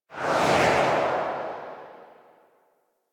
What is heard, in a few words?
Wraith Reaper Travel Whoosh Left; Warframe